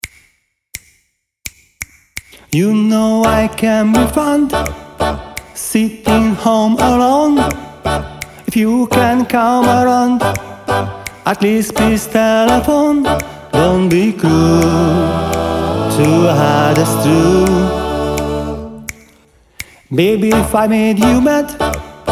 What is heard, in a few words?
acapella